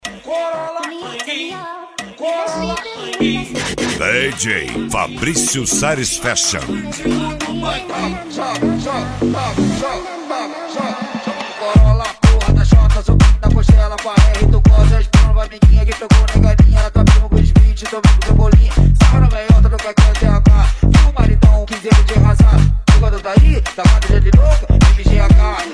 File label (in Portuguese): som automotivo; rebaixados